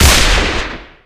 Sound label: spy revolver, weapons; enforcer; tf